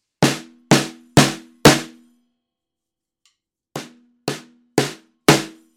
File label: Magic Pre Snare ME64; Fredenstein